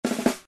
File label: Ball Success; Civiballs